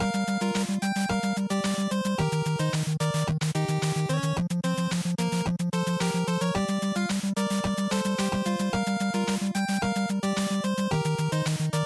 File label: NES Action Ending Loop110